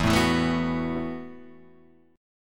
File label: E Suspended 2nd Suspended 4th; strummed; 0,0,2,2,0,2; JGuitar